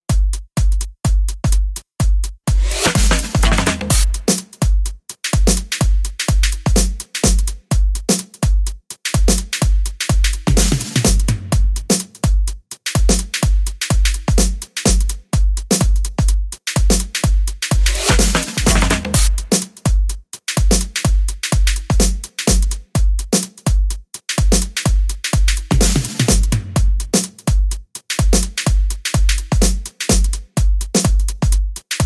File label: Clever Beat